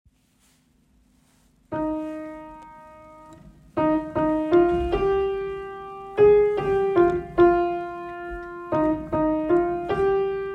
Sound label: Complete In Thee melody